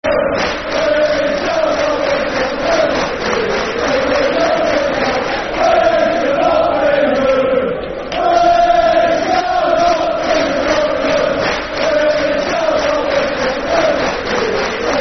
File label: We Shall Not Be Moved Champs League, Football Chants (Soccer Song); Chelsea Cfc